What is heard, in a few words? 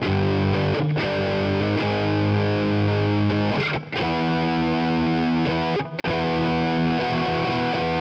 distortion violence riff 131bpm G minor